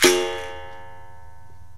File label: BERIMBAU; OMI - Universe of Sounds